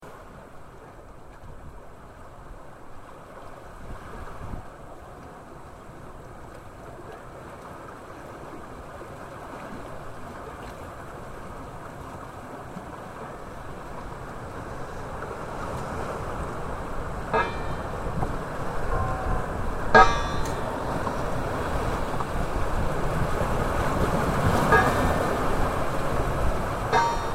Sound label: time and tide bell bosta gt bernera